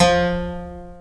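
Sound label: buff stop F3, harpsichord; AKAI S-Series CD-ROM Sound Library VOL-2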